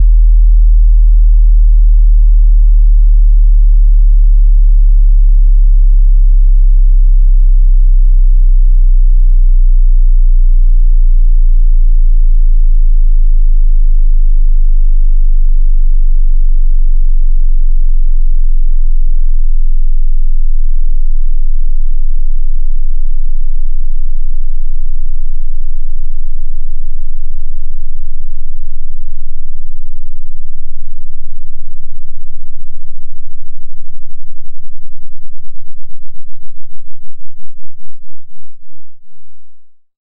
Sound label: Sweep 40-0